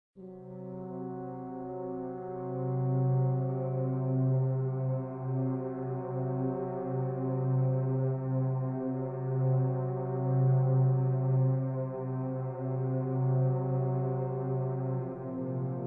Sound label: Sombre Mood; Dreamscape OST